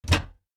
Door

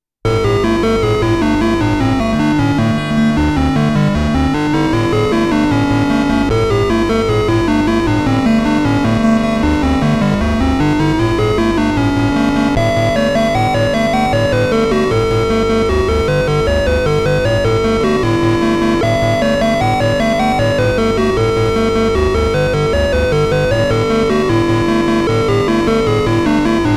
Challenging Stage~Explosion; Namco Graffiti 1; NEW RALLY-X

